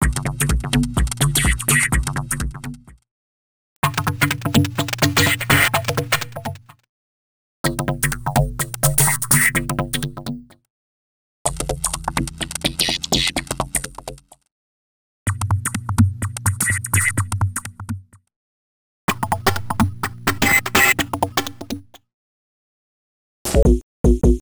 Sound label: 32bpm; X-Static Goldmine 5